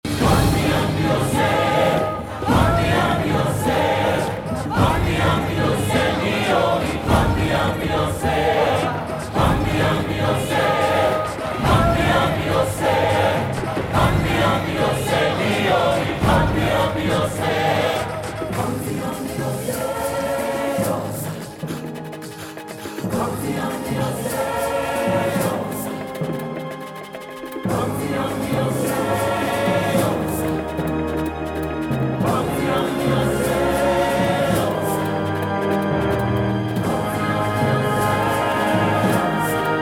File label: Animals Drum